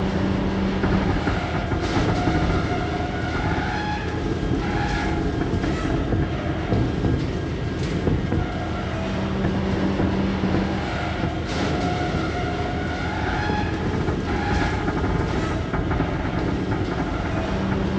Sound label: idle loop